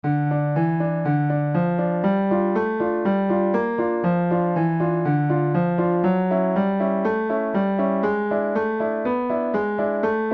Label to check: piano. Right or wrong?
Right